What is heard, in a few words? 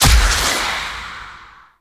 Stun; Emissary Season Two; Zeal Pre Teleport; Cinematics